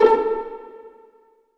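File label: A 3 pizzicato, strings; AKAI S-Series CD-ROM Sound Library VOL-8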